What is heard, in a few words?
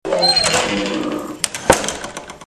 Door Close